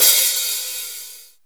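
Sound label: HAT OPEN; DRUMS CYMBALS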